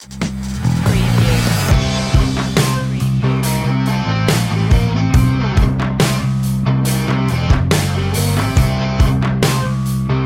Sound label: dirty mud, self confident